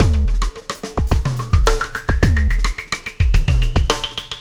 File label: Oscillation Beat